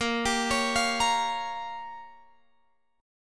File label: A sharp Minor 6th A sharp, Guitar Open F; A#m6; arpeggiated; x,17,19,20,20,17